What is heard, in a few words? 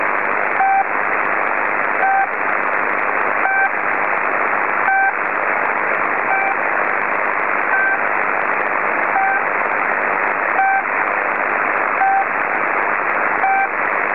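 noise with beeping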